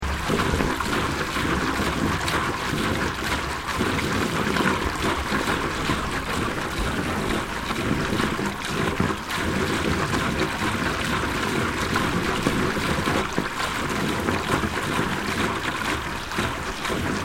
PUMPING STATION HOT SPRING STEAM OUTLET PIPE, Japanese hot spring pumping system; Machinery